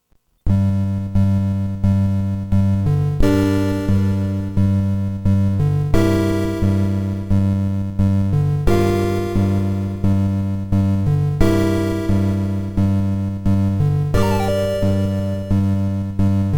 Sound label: Dungeon; u4-mockingboard